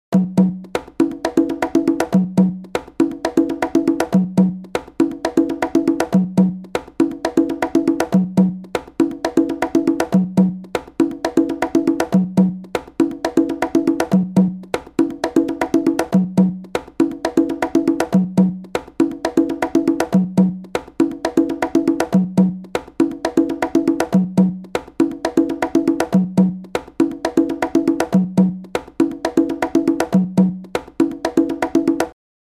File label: latin offbeat